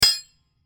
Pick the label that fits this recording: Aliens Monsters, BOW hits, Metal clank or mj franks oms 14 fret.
Metal clank